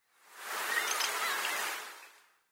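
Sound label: Common House Martin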